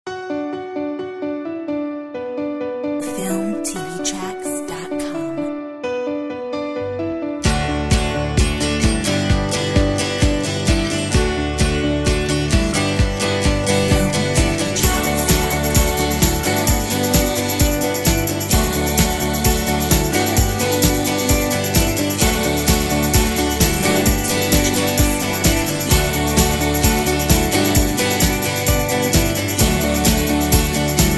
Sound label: carefree happy